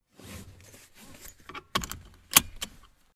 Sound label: car belt; بستن; کمربند ماشین